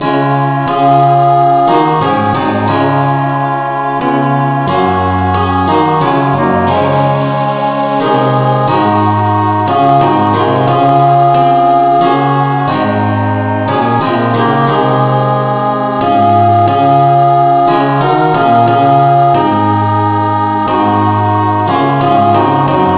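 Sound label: no vocal key C sharp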